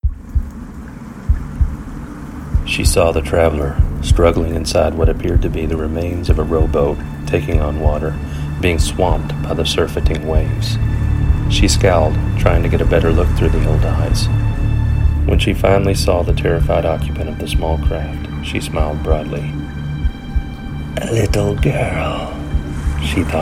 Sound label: vocal and music; prologue the beachhead